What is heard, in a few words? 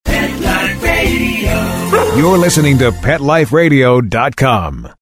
jingle